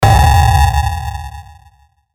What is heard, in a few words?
Hit Dirty Bass; Distortion; Blastwave